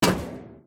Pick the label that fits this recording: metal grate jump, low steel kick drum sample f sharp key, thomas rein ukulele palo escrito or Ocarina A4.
metal grate jump